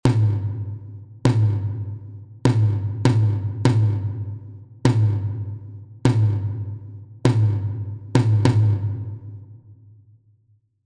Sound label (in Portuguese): Toque - Surdo Medio